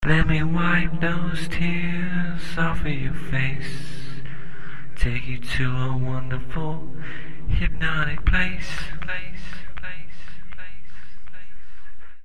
Hypnotic Place Vox

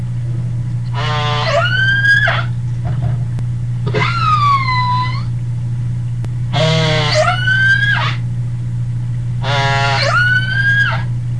Killer Whale Sounds

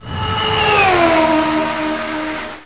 Indy Car

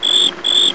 Crickets Chirping

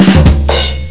Joke Drum Roll

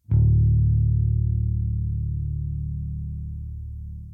Live Bass Lick

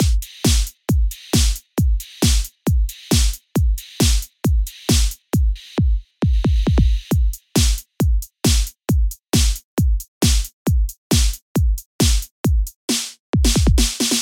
Hard Trance Beat

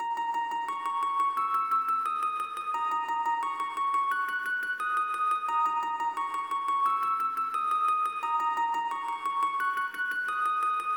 Piano Strange

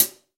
HiHat

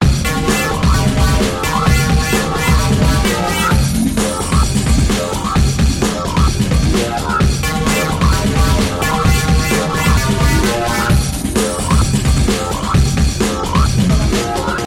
Industrial Hardbeat

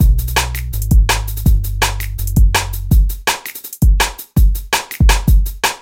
New DnB

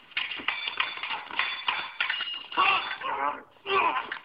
Fencing Sword Fight

